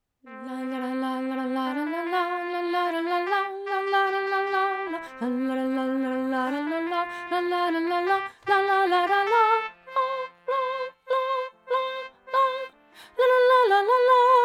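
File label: soprano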